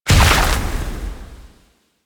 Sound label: Stone Gaze Impact; Warframe; Brawler